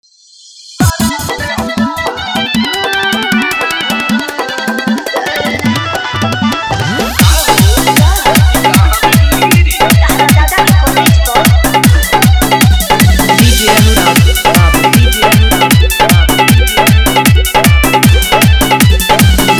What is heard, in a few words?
Full Dhollki Bass Rod Dance Mix